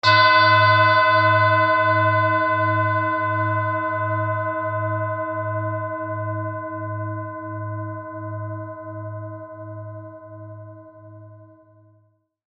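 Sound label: tibetan bowl; neptunset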